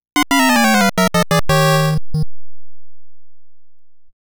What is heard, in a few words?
Miss